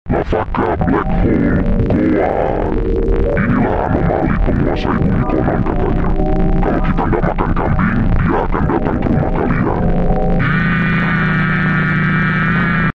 goat scary; la vaca black hole

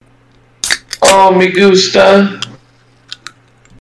pop

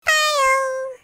fail sound effect; leokimvideo

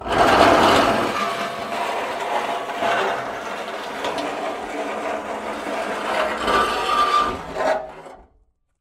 metal scrap

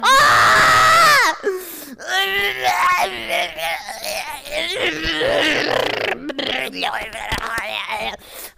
possessed

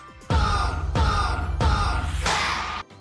boom boom boom clap

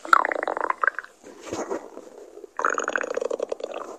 stomach growl

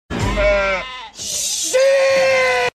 sheep; evan baxter

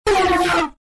jumpscare; fnas

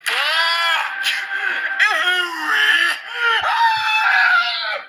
owie aaaaaaaaaaaaaaaaaah

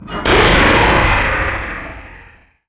jumpscare sound; fnaf fredbear

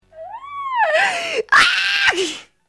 screech delayed

